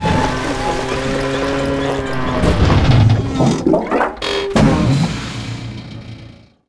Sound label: TRACTOR TIP; Cars; XBOX